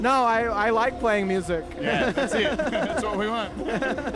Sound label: giggle